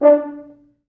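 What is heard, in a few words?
French Horns staccato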